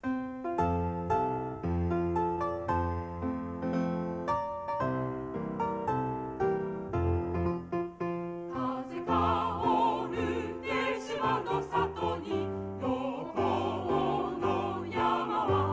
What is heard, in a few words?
chorus